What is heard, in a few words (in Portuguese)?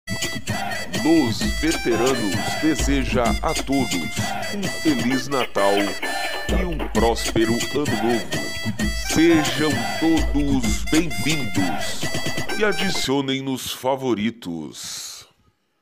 Musica; cstrike